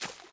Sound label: recall footstep; smite; poseidon